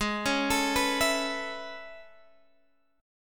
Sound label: A Major 9th Ab, chord